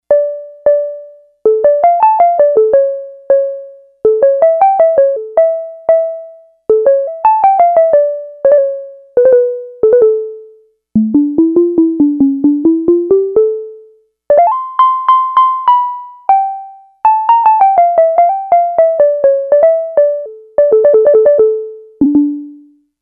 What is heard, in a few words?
Electric Piano